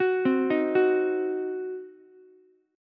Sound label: C Diminished C, Ukulele; arpeggiated; JGuitar